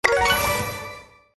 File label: win; bonanza